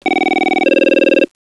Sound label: ring pattern